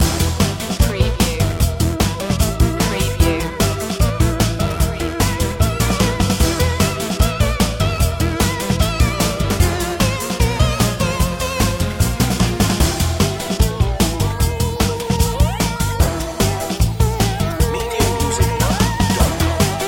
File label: chip monkeys loop